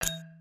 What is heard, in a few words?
open